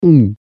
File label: gulp